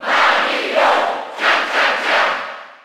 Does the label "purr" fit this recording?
No